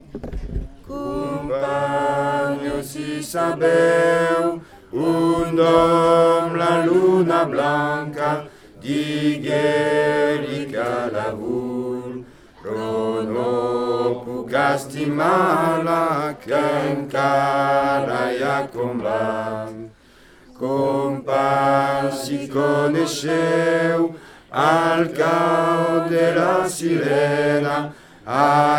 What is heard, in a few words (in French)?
basse